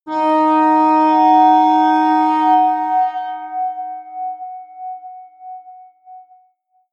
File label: atmospheric feedback synthesizer high, drones